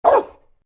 Bark

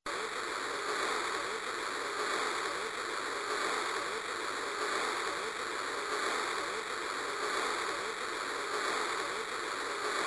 Radio

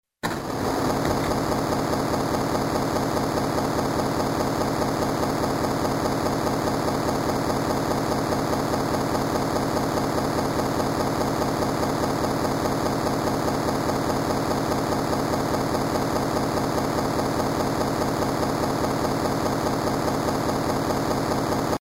Generator

